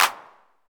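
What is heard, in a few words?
CLAP909, TR-909; 90 s Sample CDs; Sound & Vision - Gigapack I CD 1 (Roland); TR-808 & 909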